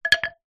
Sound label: foley wood pole drop hard ground; zapsplat